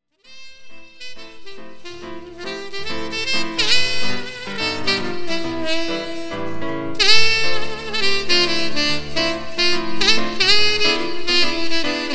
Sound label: SAX